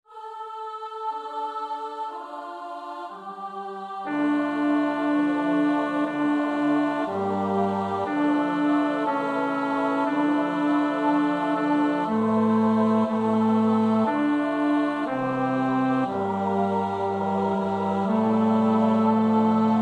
bas, koorpartij oefening; Eccard (Johannes)

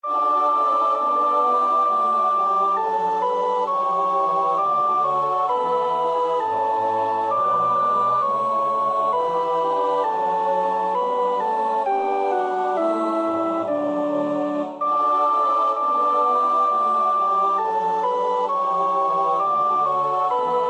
sopraan; Bach (Johann Sebastiaan); BWV 080

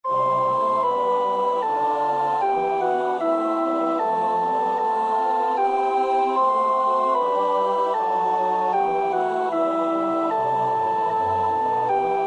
sopraan, koorpartij oefening; Bach (Johann Sebastiaan); BWV 149

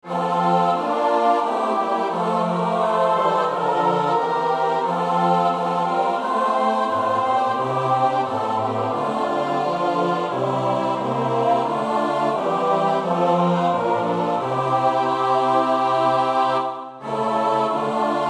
CHORALE koor, koorpartij; BWV 244 Matthäus Passion; No 37. CHORALE-Wer hat dich so geschlagen (koor 1 en 2)

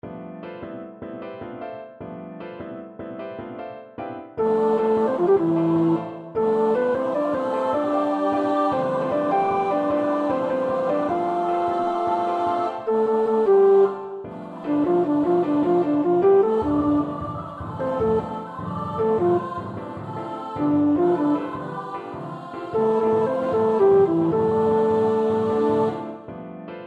tenor 2, koorpartij oefening; Whitacre (Eric); The city and the sea

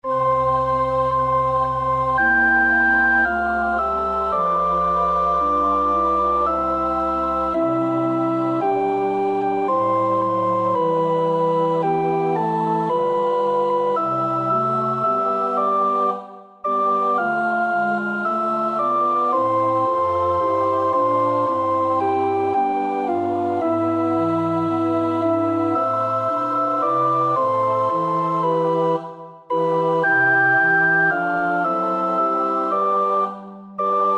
sopraan 1; Rheinberger (Joseph); Passionsgesang op.46

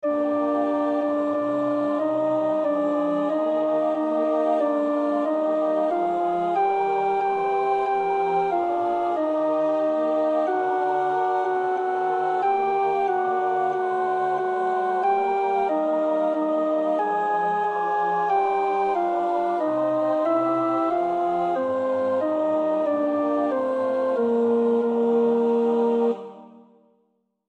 alt, koorpartij oefening; Stainer (John); The Crucifixion

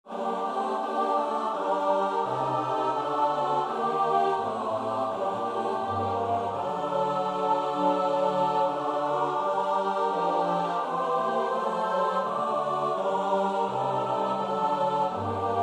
S-A-T-B, koorpartij; Bach (Johann Sebastiaan); BWV 187; Gott hat die Erd schön zugericht

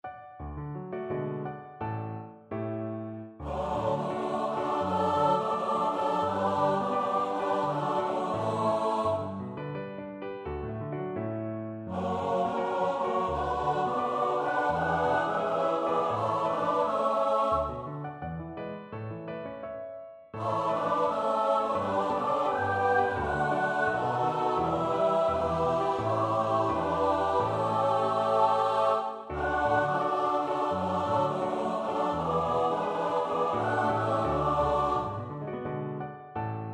S-A-T-B, koorpartij oefening; Brahms (Johannes); Zigeunerlieder; Brauner Bursche führt zum Tanze

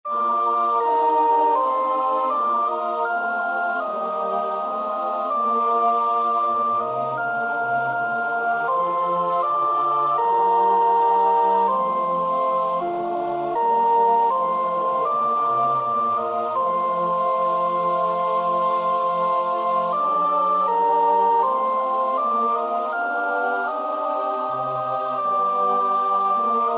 sopraan; Bach (Johann Sebastiaan); BWV 013; 6.So sei nun, Seele, deine

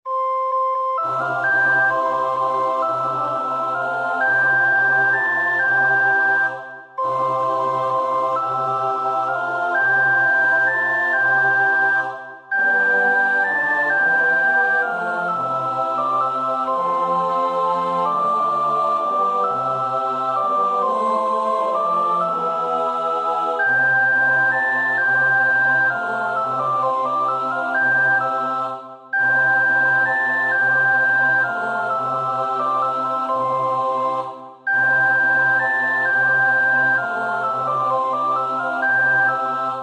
In dulci jubilo vers 4 (sopraan 1); Praetorius (Michael)

